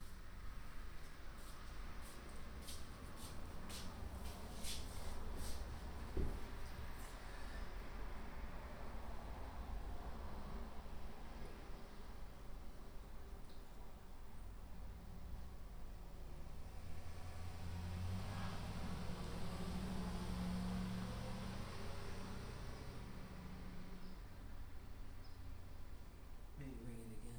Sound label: Quiet Post Office, Walking To Post Office And Post Office